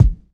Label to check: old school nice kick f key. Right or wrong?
Right